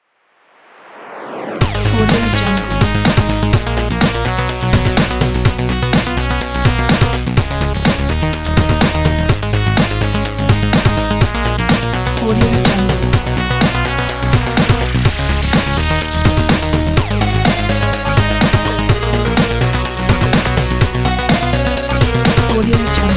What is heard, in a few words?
8bit arcade game music; intelligentmusic